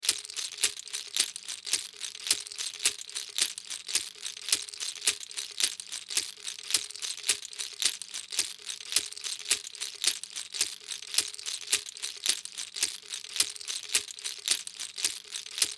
African Shaker, African Shaker Rhythm Beat Shake Loop; Musical; Blastwave